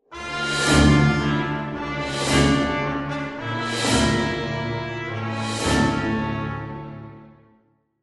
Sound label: Lose Battle; Necro2Up; heroes of might and magic online 2010 pc